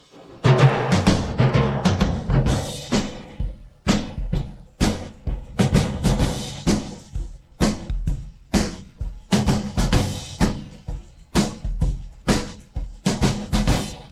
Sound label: drums